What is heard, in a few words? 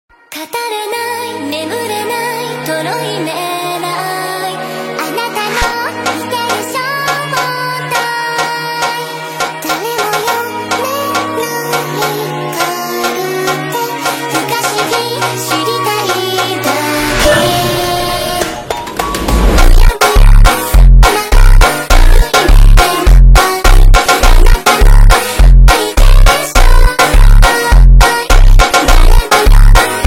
FUNK Super Slowed